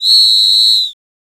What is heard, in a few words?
Coach Whistle; Samsung Galaxy Watch 8